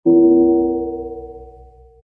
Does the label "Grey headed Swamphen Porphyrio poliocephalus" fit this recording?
No